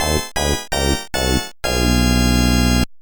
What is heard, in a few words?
DownBeat; MaxTrax